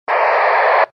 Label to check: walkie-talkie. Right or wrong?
Right